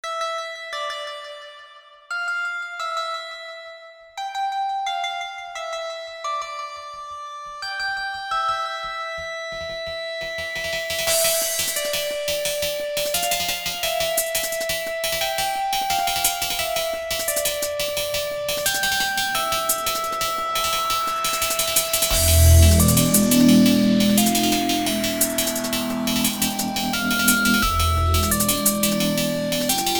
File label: darkish dnb